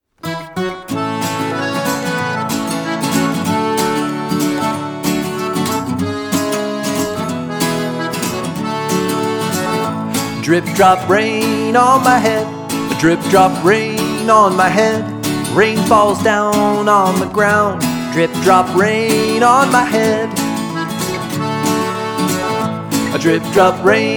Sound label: drip drop rain